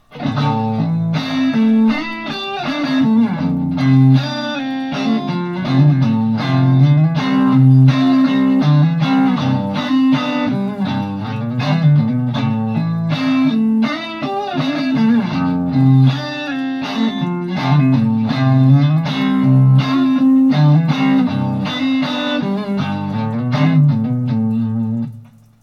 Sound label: After JTM45